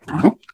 ballbot confusion

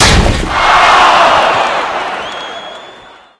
charge hit flesh range

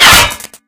bullet impact bot

